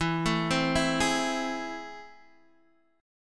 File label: A Minor Major 7th, E